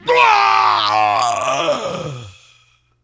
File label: Wound Critical; Putress